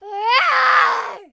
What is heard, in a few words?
VOICE Girl 4yo Scream